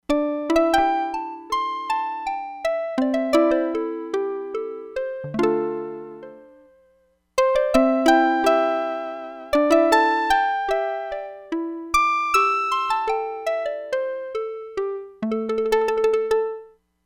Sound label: KOTO, Crumar Bit 99; Factory Programs